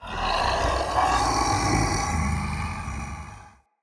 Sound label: Male Vocal Breath Light; Enemy; Mockery; Mockeries